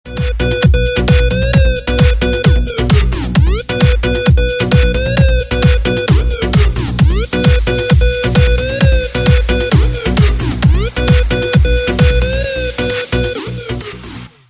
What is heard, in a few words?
korean style ringtone